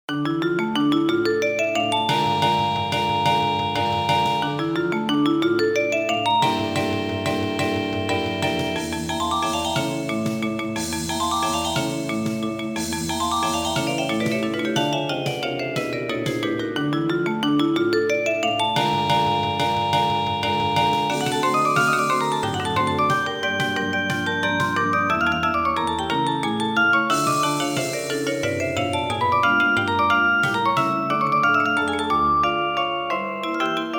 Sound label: magic carousel, marimba